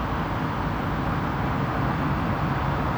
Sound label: drive, vehicle